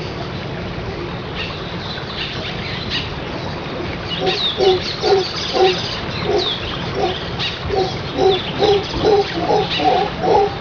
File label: jungle